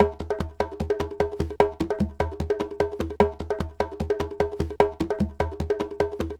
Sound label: Conga Groove, Latin Percussion